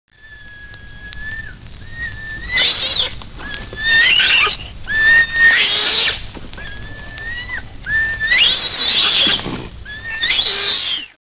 scinpanz

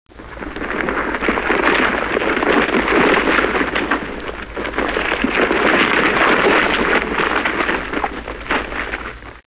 giraffa

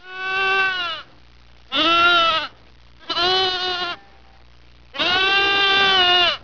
agnello

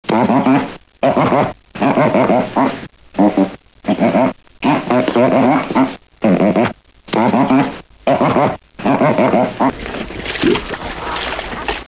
lontra

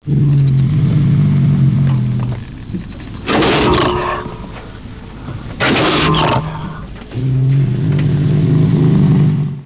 lince

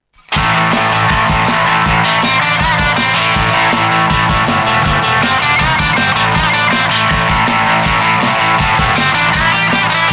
punk

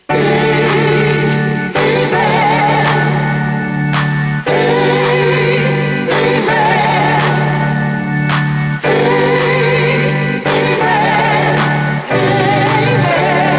gospel

